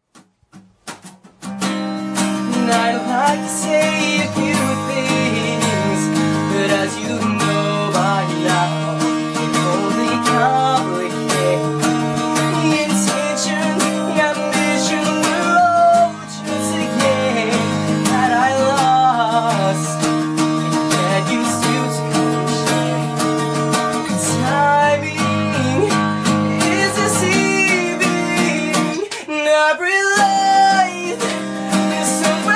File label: slow song acoustic